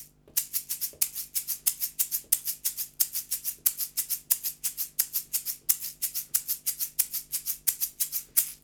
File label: shaker; mkl-5000